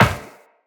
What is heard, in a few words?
Run Metal Perforated, Footsteps; Tenno